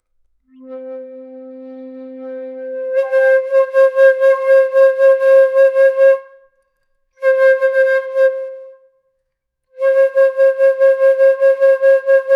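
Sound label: single ancient aulos double, flute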